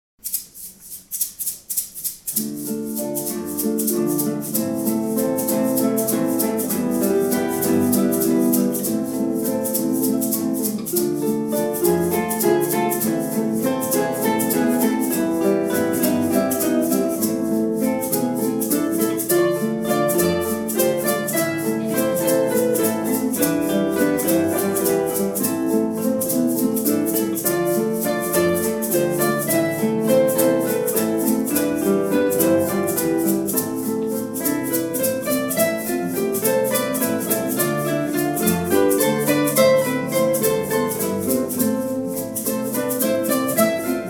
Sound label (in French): traditional chanson grecque, kytarový orchestr